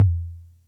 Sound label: Music Percussion Computer Tom, Drum Machines